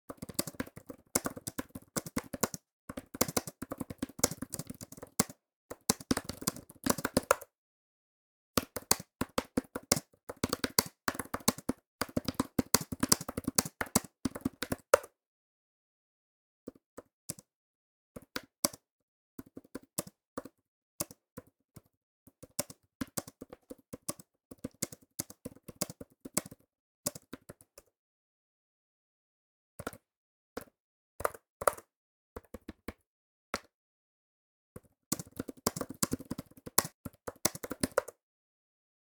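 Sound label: keyboard (bluetooth); devices accessories utilities; NS Studios - ultra sound collection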